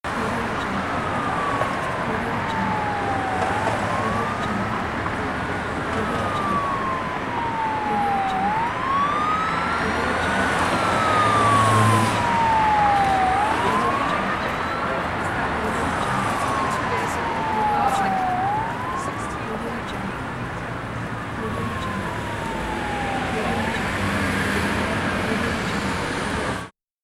city ambiance

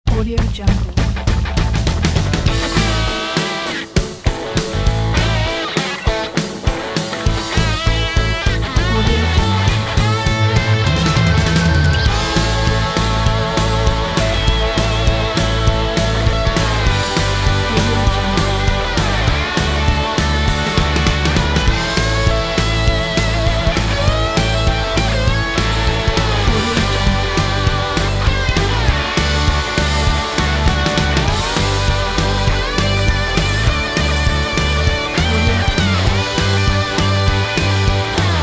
upbeat drive indie rock

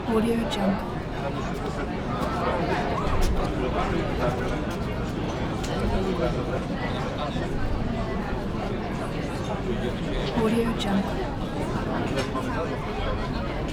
city plaza crowded outdoor restaurant ambience

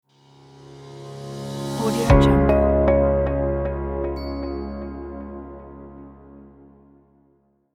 bright logo

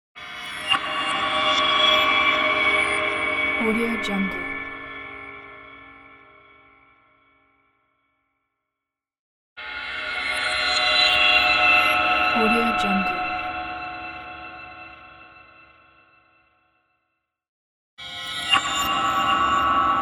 horror scary sounds

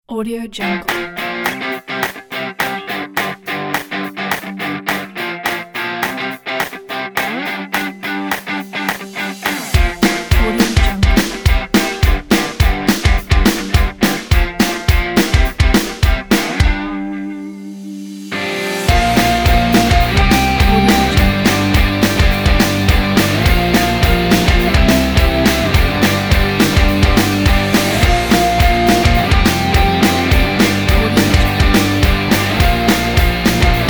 indie rock upbeat energy

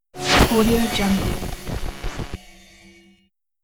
electrical energy hits metal

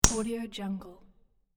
hit gem

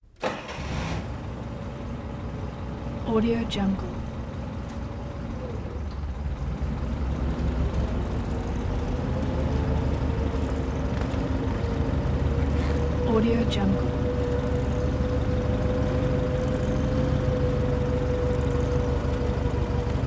forklift slow in

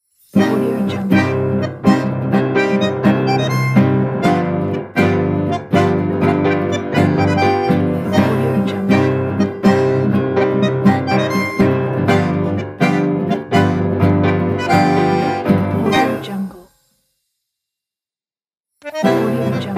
world beat and tango logo

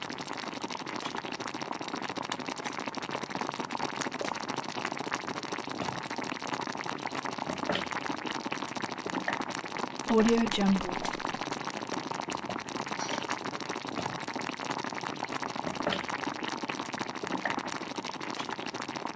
household cooking tomato sauce bubbling in pot

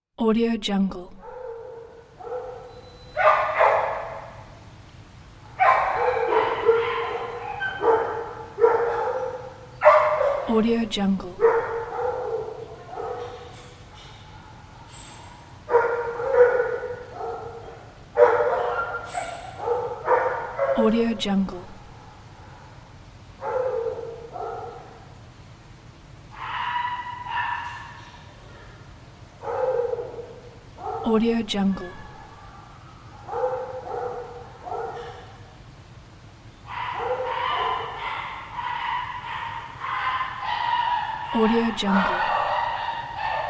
distant dogs barking

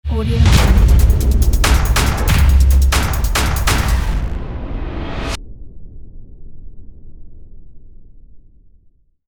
drum introduction

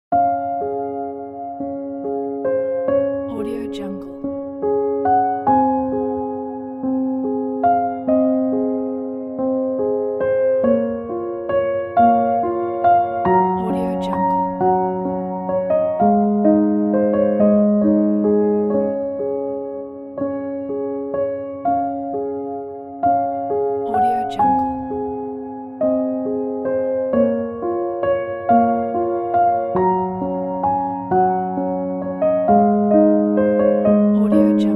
love piano

